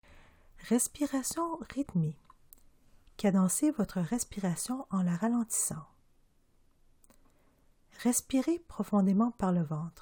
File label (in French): respiration rythmee